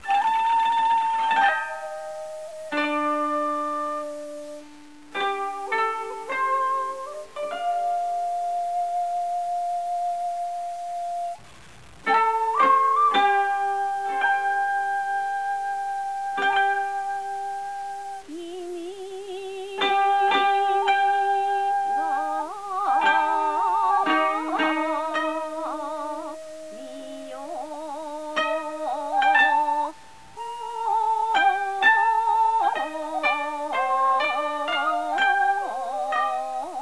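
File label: Japanese music; cs hokkaido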